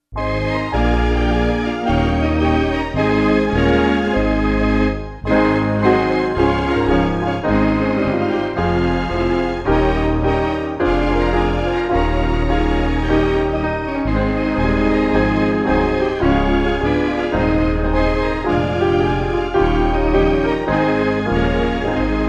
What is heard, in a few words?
instrumental reference mix; Jesus Loves The Little Children